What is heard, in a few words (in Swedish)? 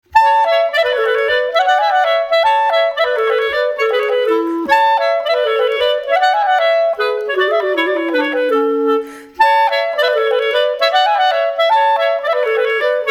Polska, Svanskog; Polska efter Svanstrom